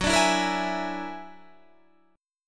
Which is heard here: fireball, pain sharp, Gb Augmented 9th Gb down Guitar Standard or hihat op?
Gb Augmented 9th Gb down Guitar Standard